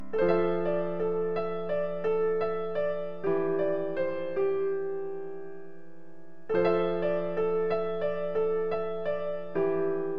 Touching Piano music

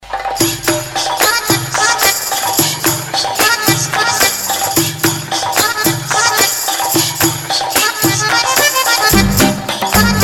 Mashallah Instrument

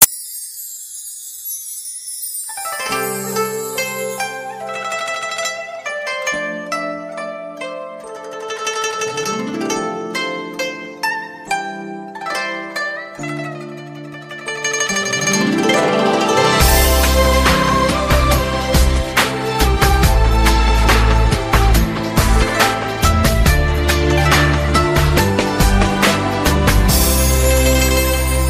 Chinese Instrumental

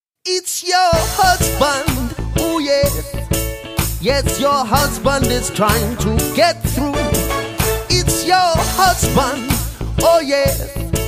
Reggae

